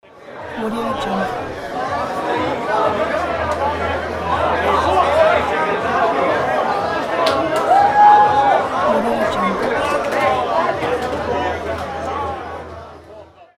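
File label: drunk crowd at bar; alexm76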